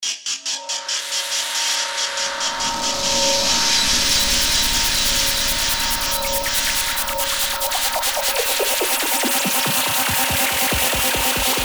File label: Sweep